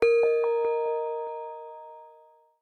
Wind Chime; Samsung SM-W2016

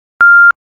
clock alarm 4, Nokia N900

